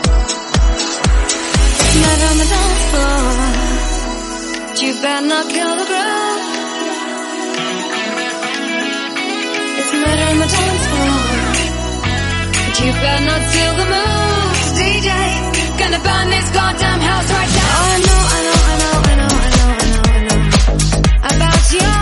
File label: DISCO 120bpm Abm